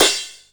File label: Crash, Jomox Xbase-09